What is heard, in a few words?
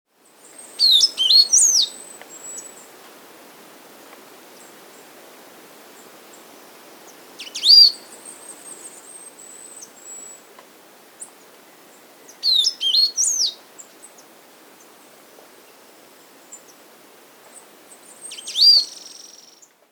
Tricolored Brush-Finch (Atlapetes Tricolor), Andean Birds; S. America